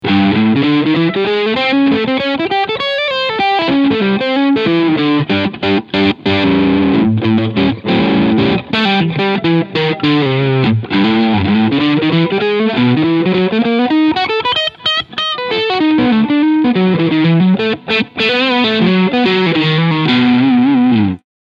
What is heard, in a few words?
HSH Crunchsound Singlecoil; Harley Benton Fusion II